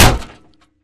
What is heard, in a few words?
Imp Bullet Light Ballistic Solid Metal